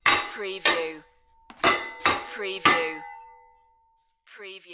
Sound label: Swords Clang